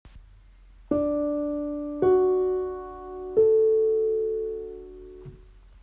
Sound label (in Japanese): レ ファ♯ ラ